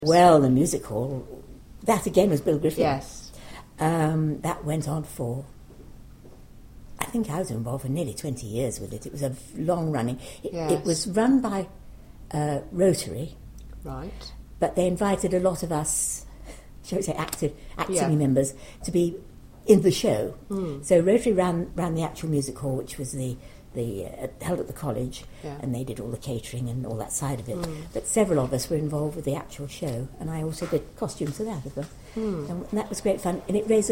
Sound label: Old Time Music Hall